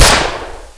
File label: gatling fire, weapons